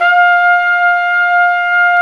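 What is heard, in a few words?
WND, Hybrid Winds, Flute & Flugel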